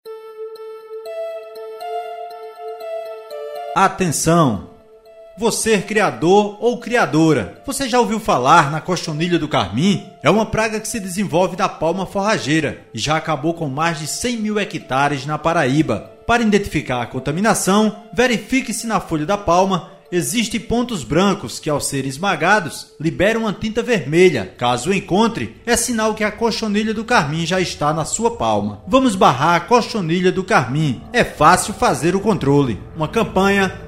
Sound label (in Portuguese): Vinheta com musica; cochonilha do carmim